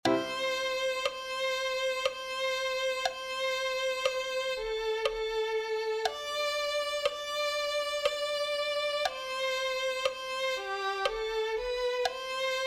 oh tu fidelidad violin

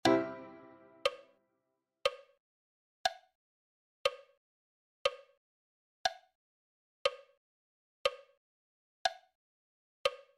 clarinete1

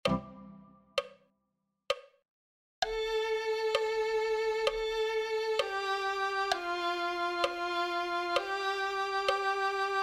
violin

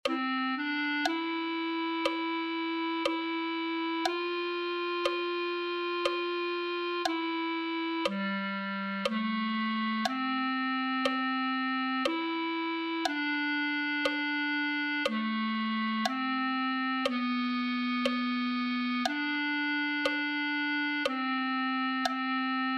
haya una morada clarinete

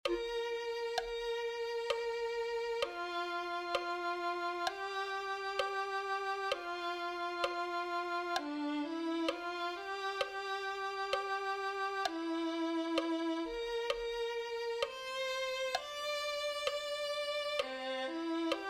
rio de dios violin

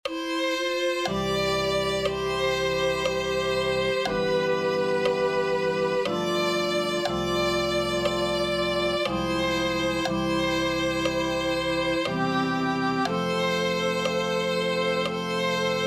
oh señor clamamos ante ti violin

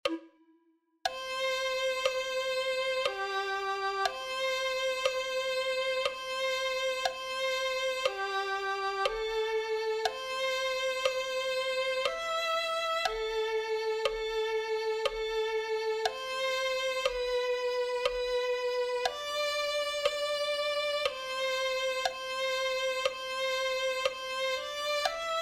haya una morada violin

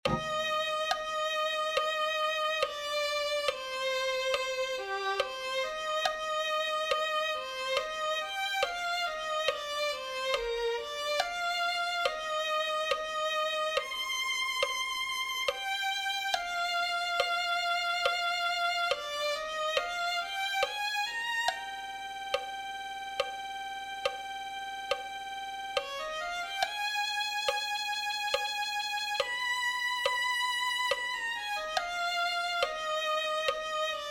- violin

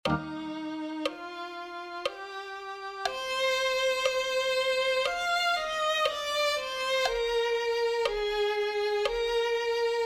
detras del velo violin

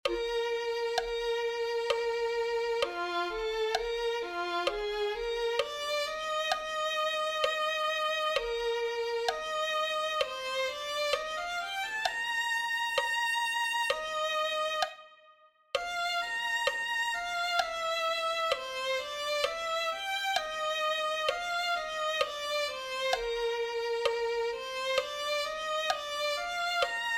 violin

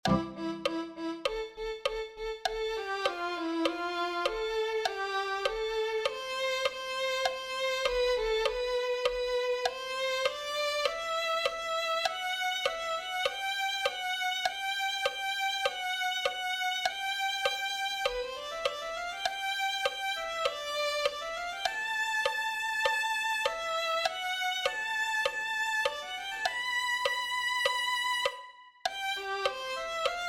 a cristo coronad violin

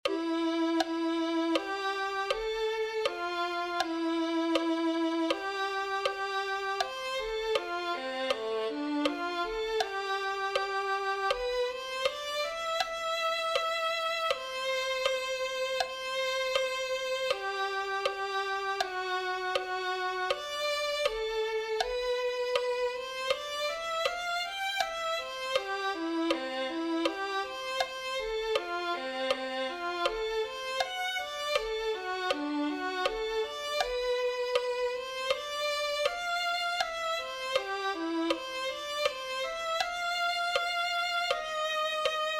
adelante oh rey eterno violin

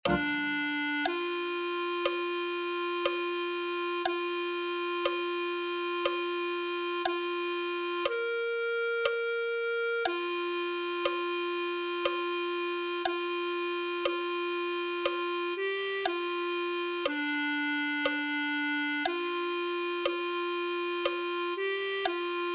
clarinet1

